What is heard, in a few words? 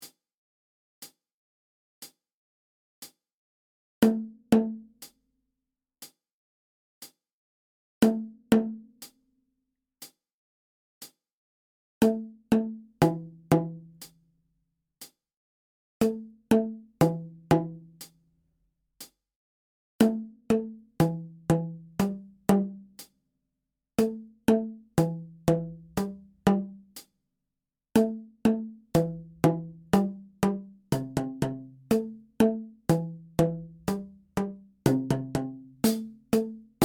boom boom beat